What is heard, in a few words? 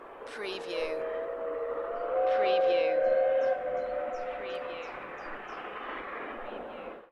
Distant snowdonia steam railway - whistle; beanstalk audio